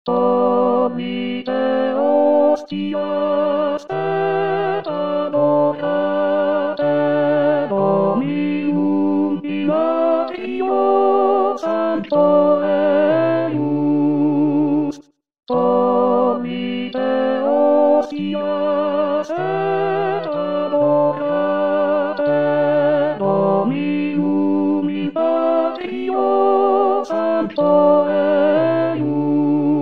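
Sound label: tenor; Tollite hostias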